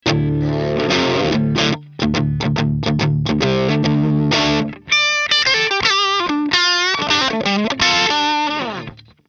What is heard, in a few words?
Amplitube SM 57 on axis